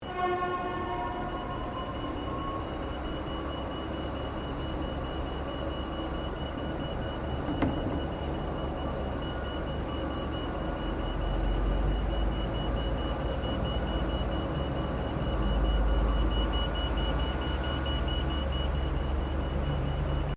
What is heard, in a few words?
Trolley Motorized Luggage Train Station Moving, Electric luggage trolley; Carriages (Sound Effects:Vehicles)